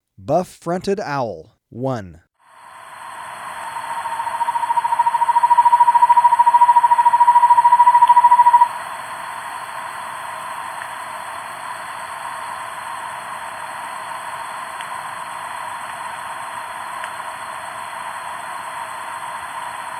Buff-Fronted Owl, Birds of Ecuador; S. America

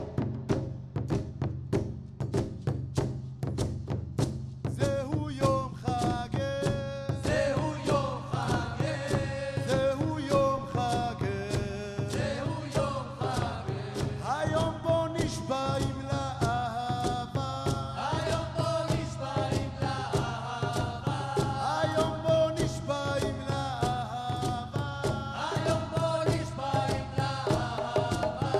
drum Song; World Music

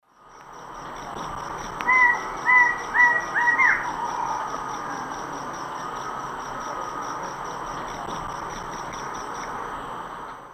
Thamnophilus murinus, song; S. America; Eastern Lowlands

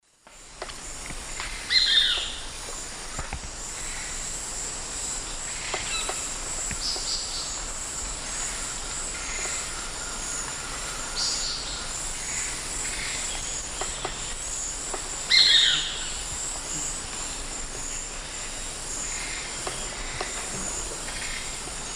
Myiozetetes granadensis, subsong or call; S. America; Eastern Lowlands

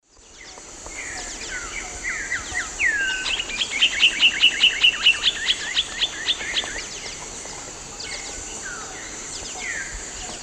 Saltator coerulescens, alarm song and calls; S. America; Eastern Lowlands

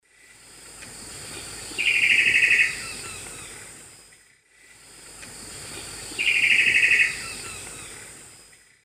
Cacicus solitarius, calls; S. America; Eastern Lowlands

